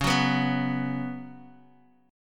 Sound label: Db 7th Suspended 4th, Guitar, Open D